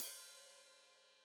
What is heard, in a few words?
Hat Foot Open, Hi-Hat; Deeply Sampled Drums